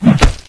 claw strike

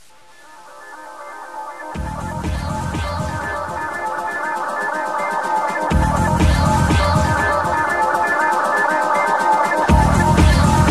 music; musiques

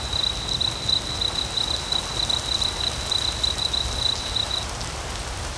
jungle wet

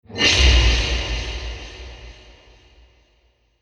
horror

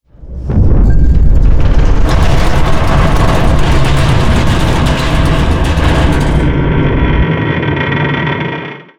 crash

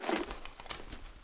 crossbow admire, weapons

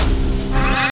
Shoot Aim Warm Up; rustbit

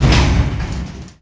freight stop

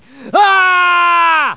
aargh; barney

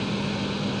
fan loop

